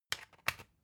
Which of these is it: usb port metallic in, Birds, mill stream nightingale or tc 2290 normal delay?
usb port metallic in